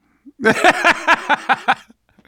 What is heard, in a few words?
laughter, survivor voice